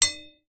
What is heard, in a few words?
impact metal rod